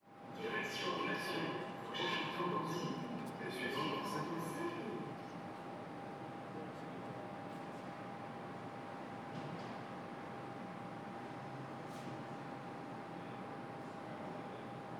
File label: Subway, station, train; lilesound